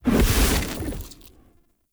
Damage Field End; Powers

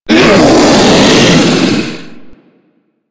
Hammer Boss Angry, Vocals; Warframe

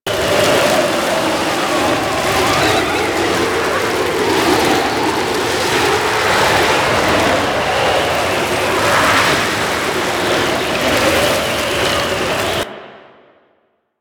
Sandstorm Loop 3D; Warframe; Mummy

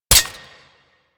Revolver Rifle Mech; Warframe; Tenno

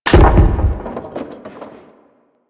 Debris Metal Wood; ambience; Warframe; Entrati

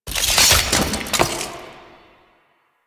Death Sword; Sentient; Warframe; The New War; Teshin Intro

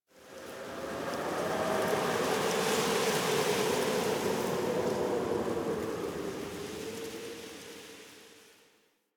Night Wind Gust Medium; Warframe; Ambience; Eidolon Plains; One Offs

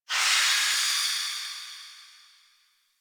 Dist Steam Burst; Warframe; Venus Open World; Venus Solaris United

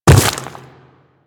Quadruped Medium Land Dirt, footsteps; Warframe; Infested

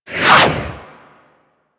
Boomerang Whoosh

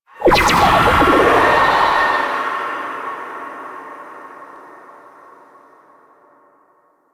Rift Walk Ability; Warframe; Magician

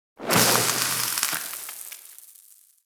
Spark Shower; Fortuna; Warframe; Venus Open World; Venus Solaris United

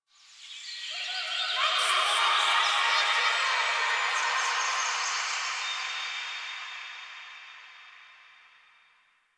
Park Memory Echoes; Warframe; Quests; TheNewWar; Ambience; Zariman

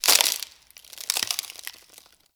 Ext Branch Rustle, Ambience

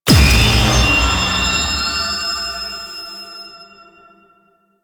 Enemy Spawn

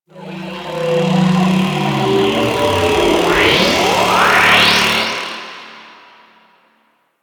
Djinn Attraction Ability Charge, Pets; Warframe

